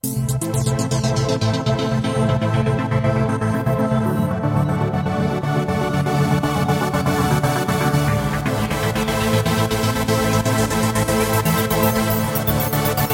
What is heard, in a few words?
Motion Pad